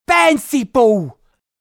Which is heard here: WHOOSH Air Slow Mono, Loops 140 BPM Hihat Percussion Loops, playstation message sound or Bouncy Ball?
Bouncy Ball